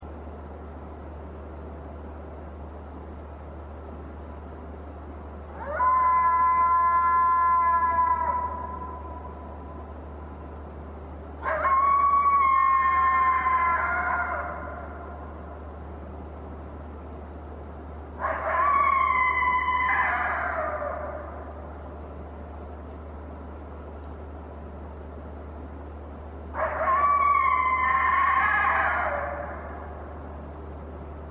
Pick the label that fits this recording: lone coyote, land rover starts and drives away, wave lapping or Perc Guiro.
lone coyote